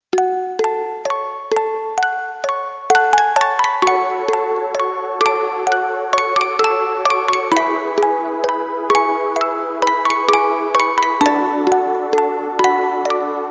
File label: special music tone; baresip